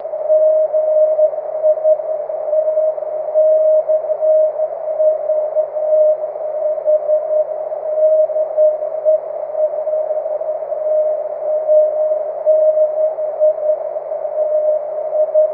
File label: cw